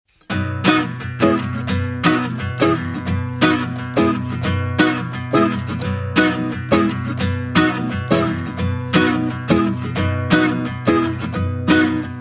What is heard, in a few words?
acoustic guitar, music on hold